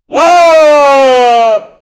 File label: voice yelling; kratos